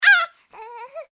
human baby 3 months upset yell moan; Babies; Voice; McKinney Sound

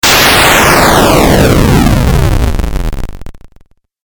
8 Bit Style Thrusters; Video Game Sound Effects

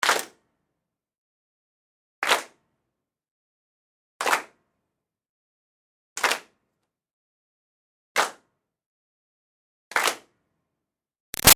Crowd Small Claps To The Beat Tight; Interior; Applause; Sound Effects:Human; Big Room Sound

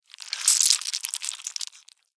Larva Maggot Move, Slimy, Insect, Bug; Animal; BlastWave

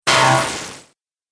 Frothy Laser Zap, laser gun

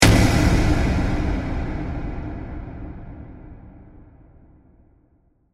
Hit Airy Dark

